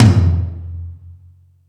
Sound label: Toms, Drum Machines E-MU Pro-Cussion